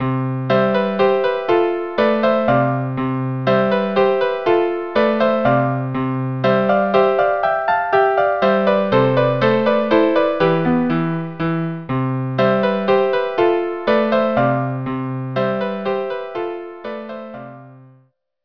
duet; flower

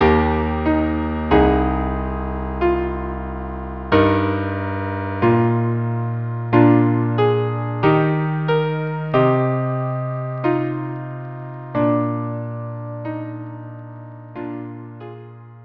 trio; nimrod

